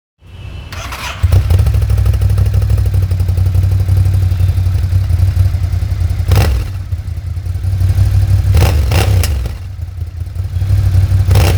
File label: Scrambler sport pro